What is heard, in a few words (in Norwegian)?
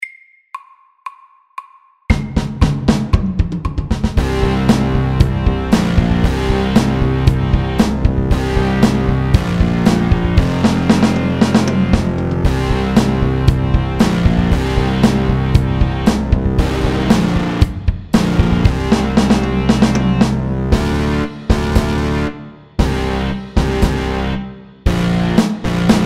Heavy metal FORT